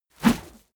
whoosh dirty

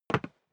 step boots single wood parquet single stair down; schritte

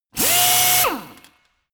impactor hard burst; maschinen; bohrer

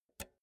pull out jack multi socket fast; objekte; elektronik

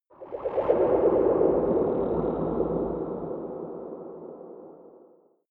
space alien spacecraft underwater drive; raumschiff

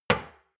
shot glass place table medium; berufe; gastronomie; soundpool

